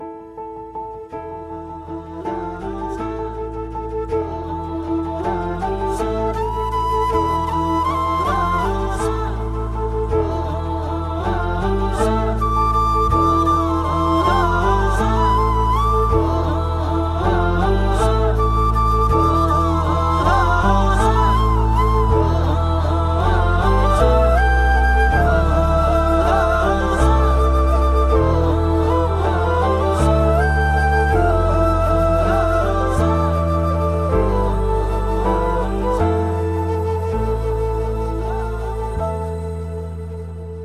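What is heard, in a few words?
REJECTION background music ringtone; PREMALU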